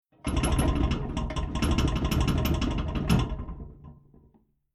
Domestic Household Radiator Shook Vibrates Metal Rattling Earthquake; Miscellaneous Impact